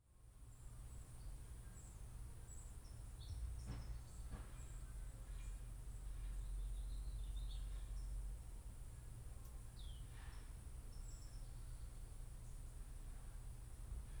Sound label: Backyard Active Suburbs, Suburban Sonoma; allmedia sound; Cinematic Ambiences 01